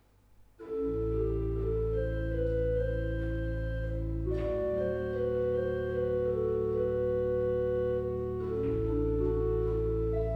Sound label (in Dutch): adagio, orgel; Nieuw Balinge; Drenthe